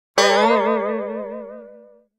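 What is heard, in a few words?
spring twangy, funny cartoon sounds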